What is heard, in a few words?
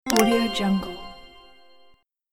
magical mystery button